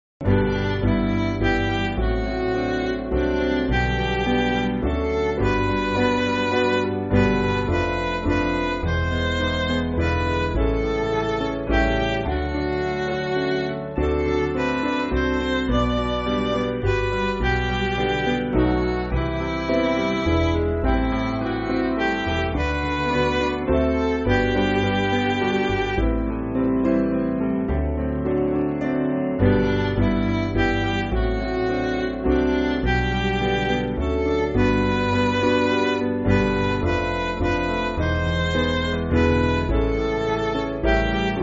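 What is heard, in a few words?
Midi, Music Backing Files for Churches; Just As I Am; Dunstan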